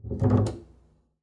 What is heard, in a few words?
pizz noise strum loud, double bass; dsmolken